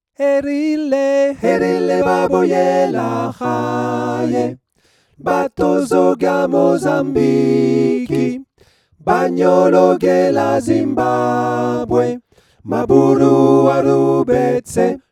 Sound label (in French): 4 voix